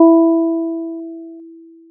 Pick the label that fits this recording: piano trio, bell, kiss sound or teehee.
bell